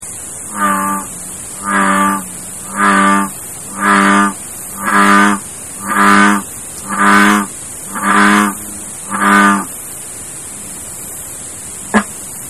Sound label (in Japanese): hikigaeru